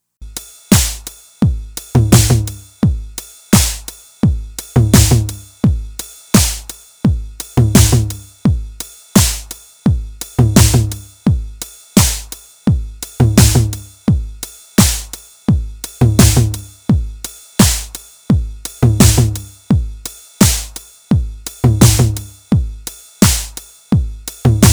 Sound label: TR-909 Original